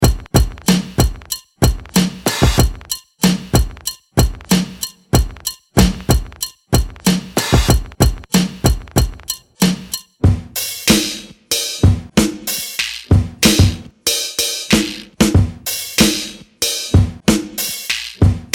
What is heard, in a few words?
boom bap drum kits